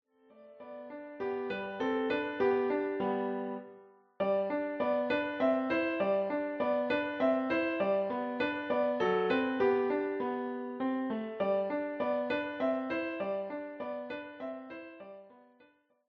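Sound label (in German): Kommet ihr Hirten, Klavier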